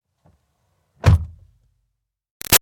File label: Car Highlander Door Interior Close Change; 2005 Toyota Highlander V6; Vehicles; Cars